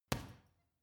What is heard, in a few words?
boxing punching bag side hook medium